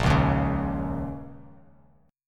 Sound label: A sharp Minor Double Flat 5th, Bass Standard; strummed; 6,4,1,6; JGuitar